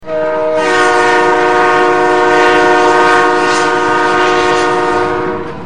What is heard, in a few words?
Train Power